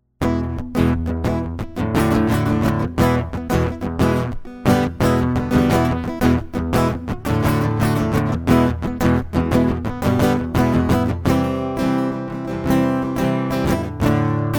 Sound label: acoustic; MegaEpix